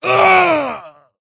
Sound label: wound crit; Skadi The Ruthless